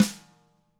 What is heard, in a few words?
HIT, TAMA SNRD, Drums; ILIO; Double Platinum Drums 2